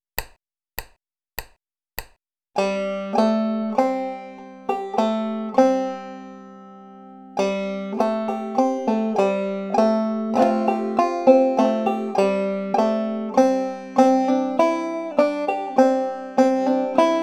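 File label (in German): mit hammer on pull off und roll, banjo; angelina baker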